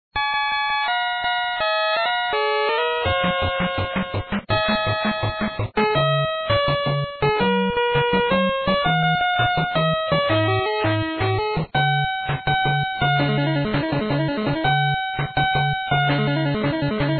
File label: bgm Music; game-aircraft-bomb